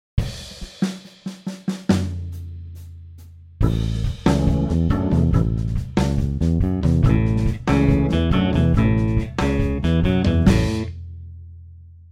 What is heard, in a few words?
Blues Rumba guitar